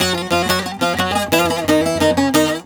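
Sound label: D#, bouzouki; USB Soundscan vol.53; Mediteranean Traditions; AKAI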